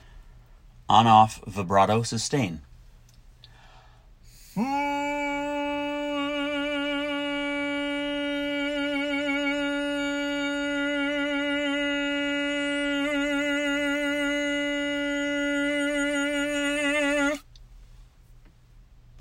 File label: On Off Vibrato Sustain